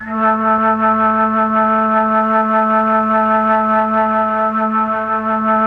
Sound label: MELLOTROF